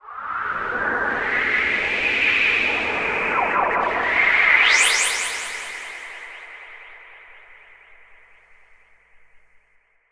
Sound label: Sweep; noise